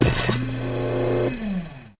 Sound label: Hydraulic Pump Dent, seats & stools